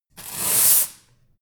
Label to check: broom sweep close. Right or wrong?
Right